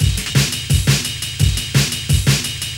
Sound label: Amen, breaks; Music2000